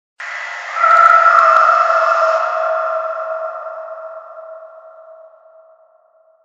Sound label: Hoot Hoot ringtone